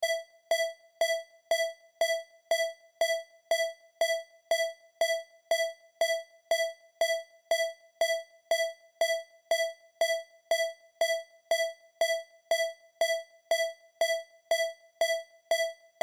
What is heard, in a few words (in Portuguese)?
amostra de pulsos com periodicidade